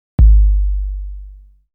808 Saturation

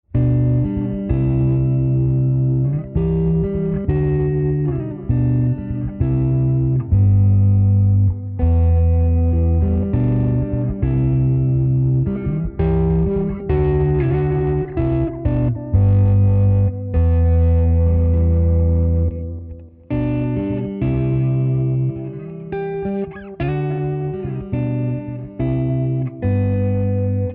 Bass Roll off

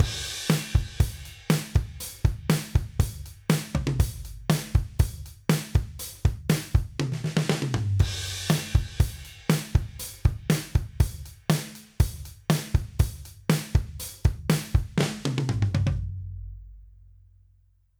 Drums

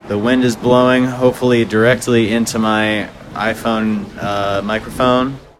de wind iphone after

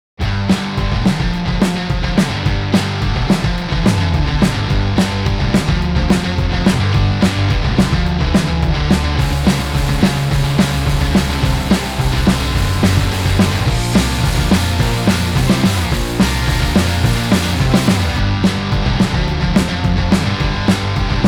Reamped Guitars Full Mix

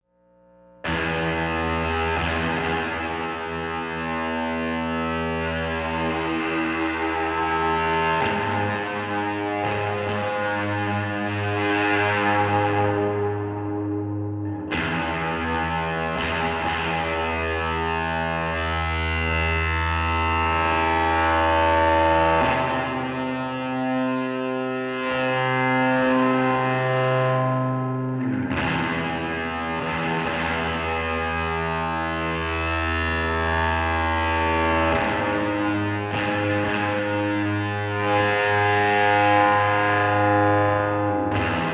evil filter emt 140 strat

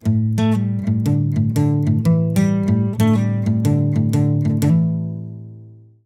Gtr

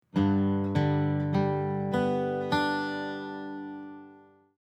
arp ceramic

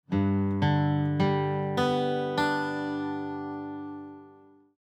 arp graphite

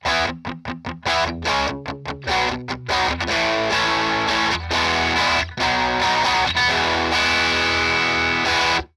Guitars Without Fixed Polarity

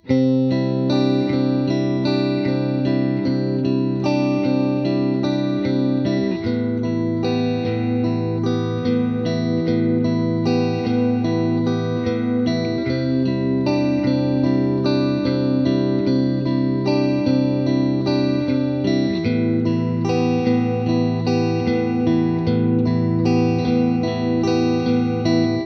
guitar no delay

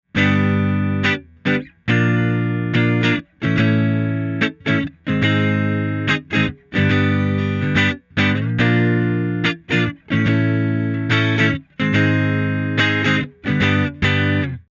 room white

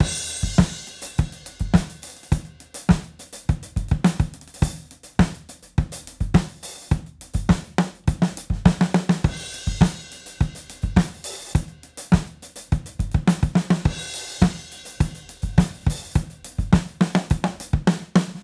bus fx comp

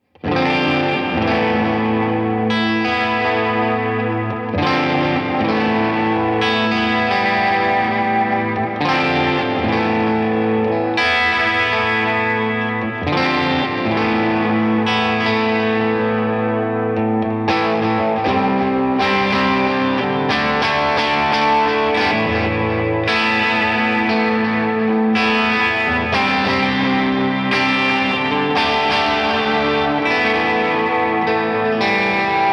strat vox sdd3000; mercury

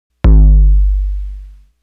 808 Distortion

